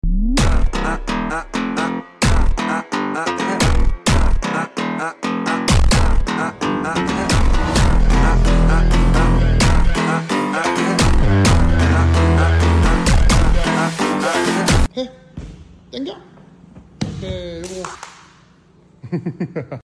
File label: Phonk